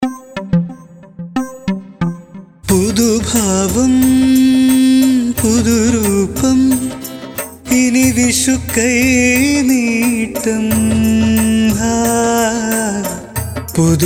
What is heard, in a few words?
JINGLE SINGING